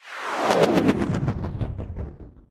Ui Zoomout

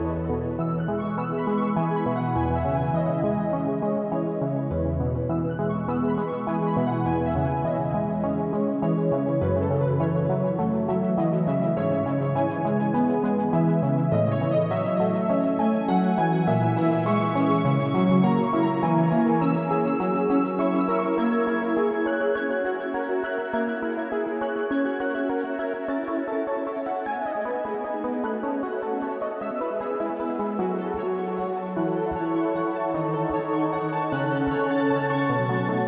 Music Pause; sayonara wild hearts 2019 gamerip

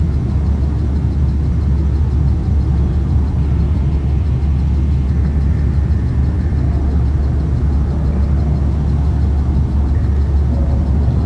Ambience (Factory); donkey kong country returns 3ds wii wii u 2010

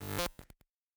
Glitch

